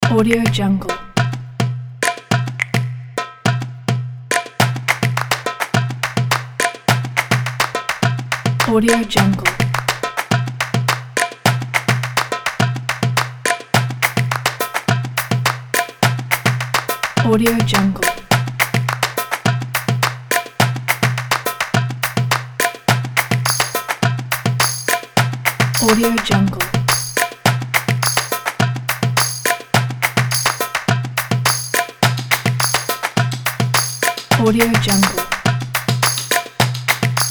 a stylish rhythmic drums; naitong

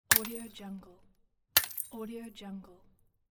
light bulb break

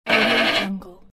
ram

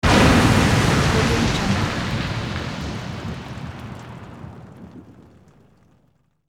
monster crashes into ocean

